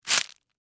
Forest Branches, Footsteps, Forest, Sticks, Branches, Single; Blast Wave